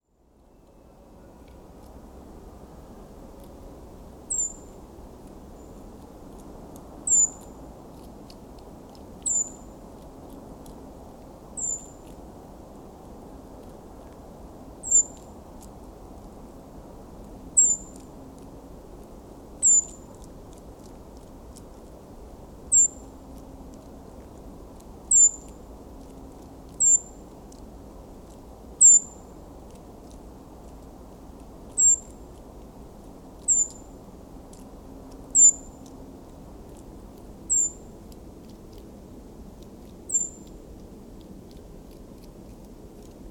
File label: woods; krasnozavodsk